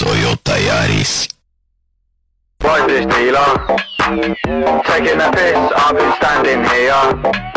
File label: toyota yaris